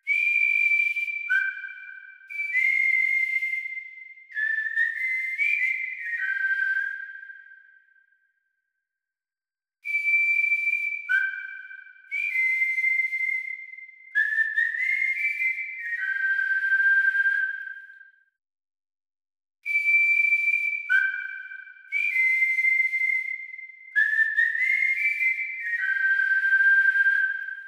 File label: Whistle Instrumental; Taaza Khabar